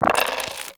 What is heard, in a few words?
Dice Roll Five; Warframe; Koumei